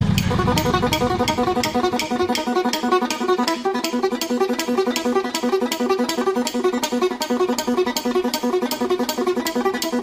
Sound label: INSTRUMENTAL RINGTONE